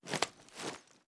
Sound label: ar xmag reload empty fast raise, weapons; gmod; viper